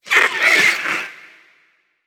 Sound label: Ability Gooberball Fire; Evolve; Gorgon; Lurker